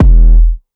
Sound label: Kick Gritty, 808 Kicks; Slate; Vintage Drum Machines Volume 2 808 Edition